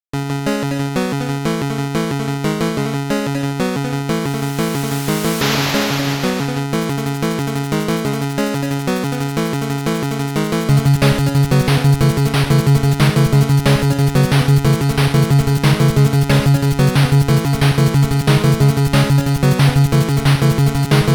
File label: In Game; T&C Surf Designs; NES